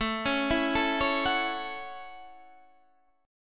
A Major Add 13th A, chord

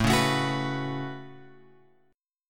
A 6th Add 9th A, guitar chord; A6add9 Chord {5 4 7 X 7 7} strummed

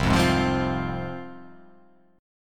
C Suspended 4th Sharp 5th, C, down, chord; Csus4#5 strummed; JGuitar

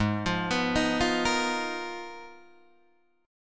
Ab Minor 6th Add 9th, Guitar, DADGAD; down

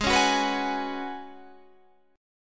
Gb Suspended 2nd Suspended 4th Ab

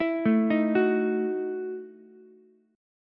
E Major 7th Suspended 2nd Suspended 4th, Ukulele; EM7sus2sus4 arpeggiated

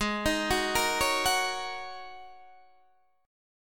G sharp 9th Suspended 4th, Guitar, Open D

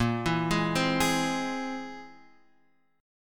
C Augmented 7th Bb

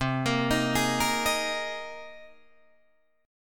C Minor 7th Sharp 5th C Guitar DADGAD

